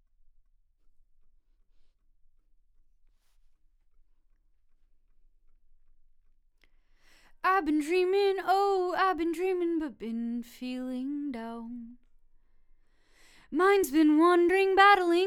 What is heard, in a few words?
Vox start new